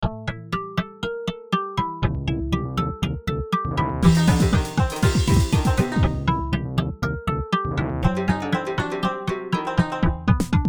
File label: MIDI Blues